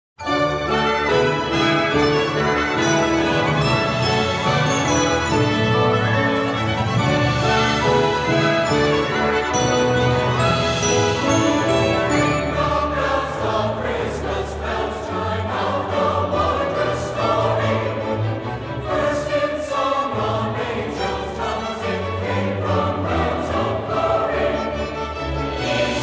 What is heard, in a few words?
Ring Bells